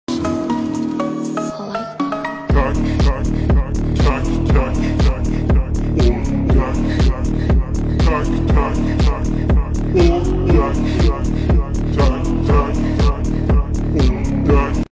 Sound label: Remix